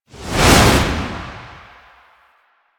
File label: Dash Whoosh; Warframe; Lasrian; Lasrian Soldiers; Abilities; Butcher